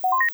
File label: No Service; ZTE C76; alarm